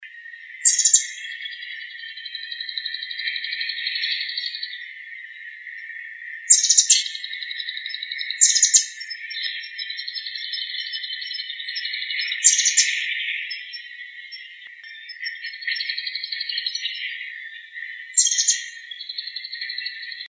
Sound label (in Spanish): voz piojito común